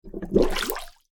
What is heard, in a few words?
Bubbles Rising To Surface Of Water; Bubbles Rising And Breaking Surface Of Water; Nature; McKinney Sound